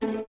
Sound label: Violins A Pizz, String Ensembles; Miroslav Vitous; 11 Violins